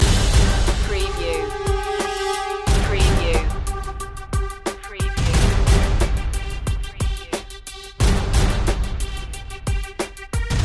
epic title underscore loop

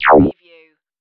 future

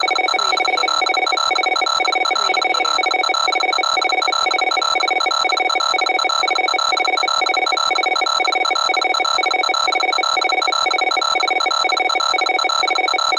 Computer Process Beep

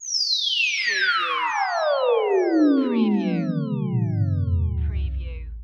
Space Scifi Sound - downbound

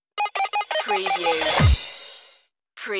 bubble gum beats stinger

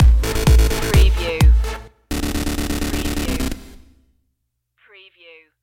dance stinger

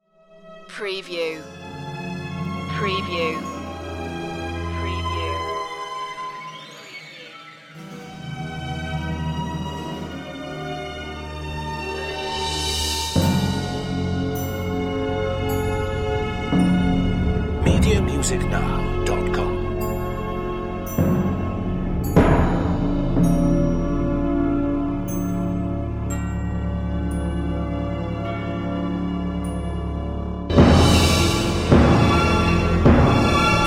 orchestral suspense

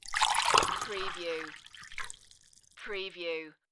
Pour coffee into a coffee mug

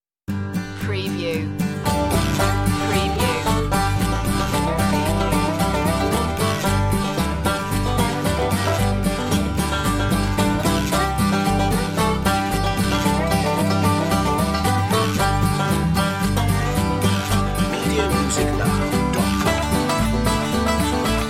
farmyard blues

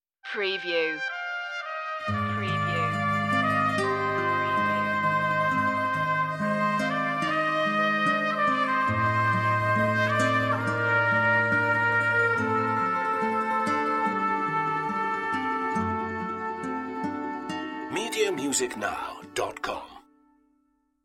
no violins

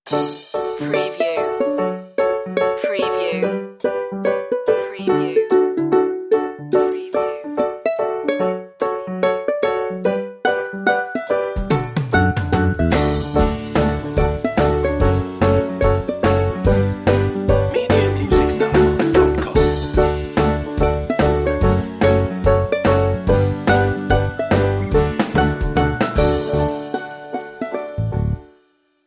happy commercial

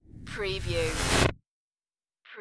tech glitch sparkle

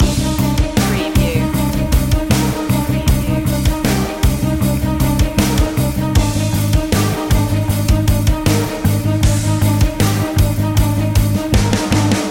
drama heavy loop

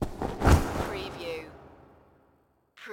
STAB REVERB

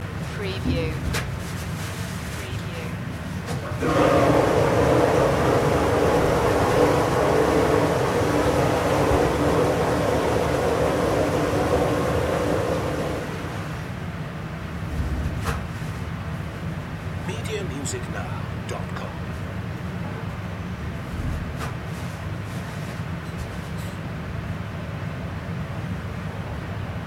public toilet ambience loop

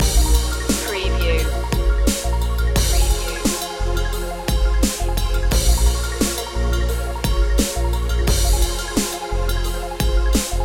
dub glide loop

